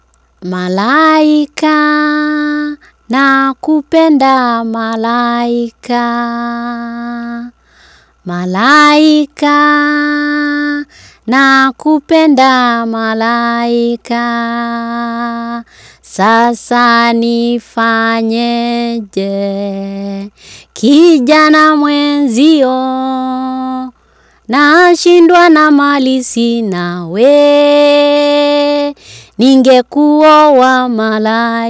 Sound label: song, swahili